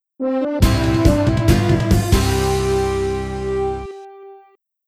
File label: Excitement Message; Wii Room (Theater Update) Soundtrack